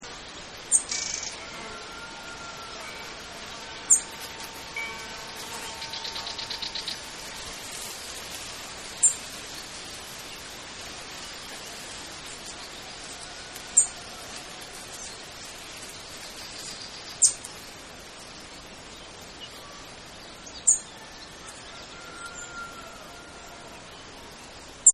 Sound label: Wind Bell Flies Birds; Nature Scenes; Environmental